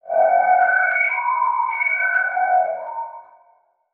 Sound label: Production Element Title Transition Bed